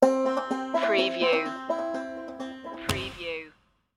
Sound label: stinger